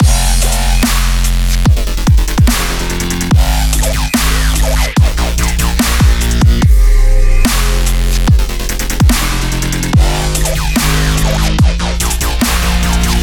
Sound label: extreme sports